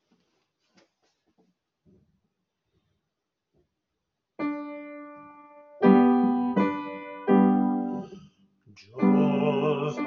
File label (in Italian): giovani liete alto